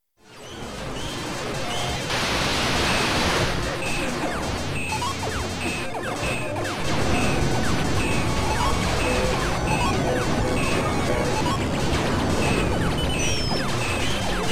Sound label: Arcade Ambience